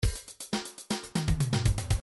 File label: disco 1bar